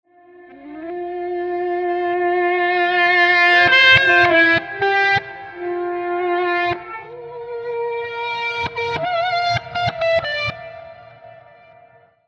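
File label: Guitars Melodic Pulses and Phrases reverse; Scoring Guitars 2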